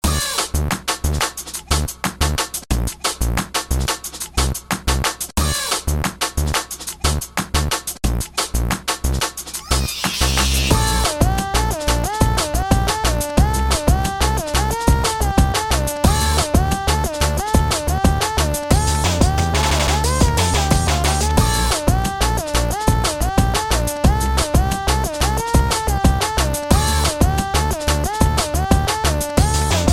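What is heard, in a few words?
instrumental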